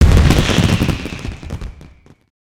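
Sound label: cannon explode; super cannon; destructibles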